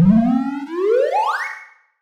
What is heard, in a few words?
charge; godot